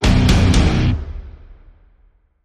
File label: Stab Guitar Triple (B)